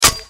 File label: hit